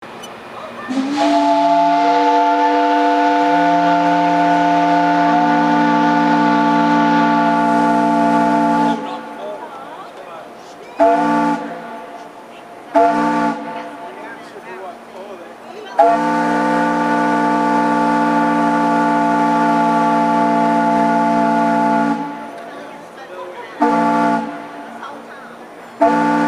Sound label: american queen steam whistle